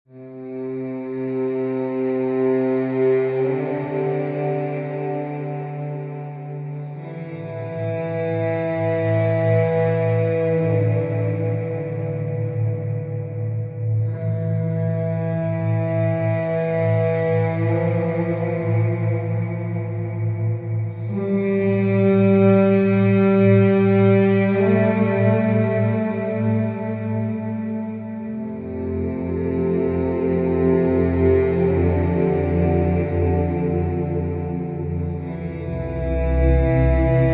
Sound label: 432 Hz Sound Therapy